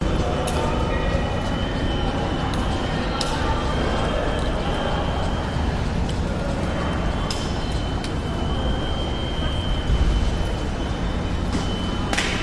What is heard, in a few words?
seabus station walla beeping steps walking people ambience; vancouver; waterfront station; clacking; your free sounds